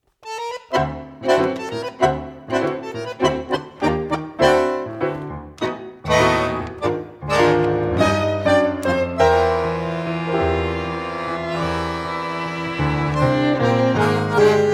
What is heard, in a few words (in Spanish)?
Tango progresivo Candombe milonga